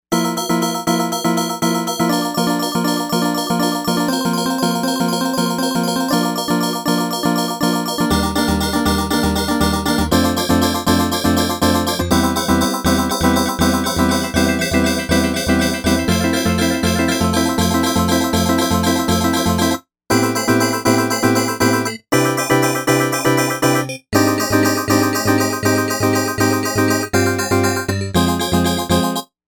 Glass, Novation UltraNova; Factory Patches

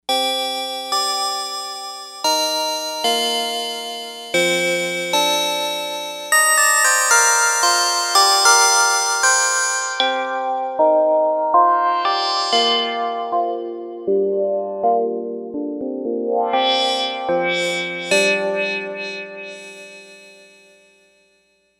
Chime, Novation UltraNova; Factory Patches; Phileas Chime

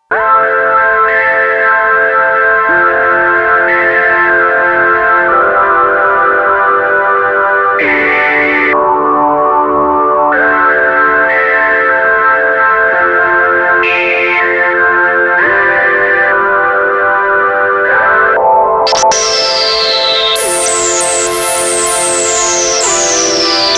POLYPHONIC FILTER EFFECTS Poly Sample and Hold; INTERFACING FOR POLYPHONIC FILTER EFFECTS

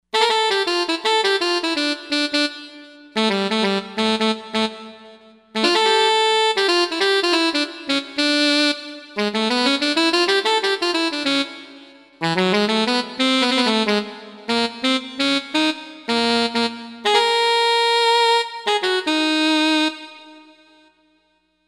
Alto Lead Sax

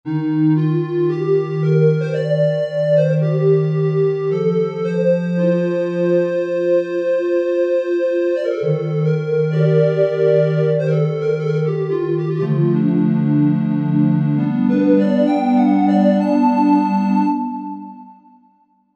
Mellow Square Pad, Korg Wavestation A-D

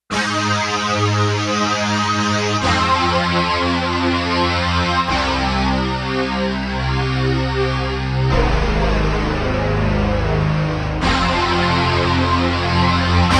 Dst Gtr Walls; Digital Synthesizer

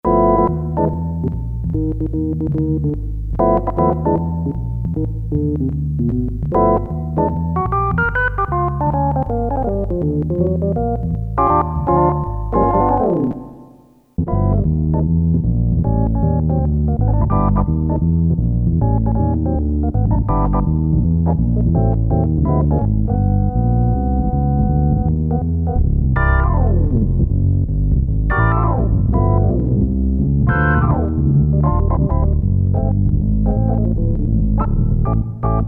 DRAWBAR ORGAN, Roland Jupiter-8; Factory patches

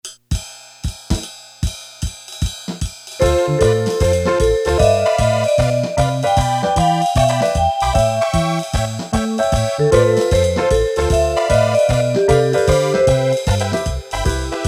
Swing, Casio CT-370, Rhythms

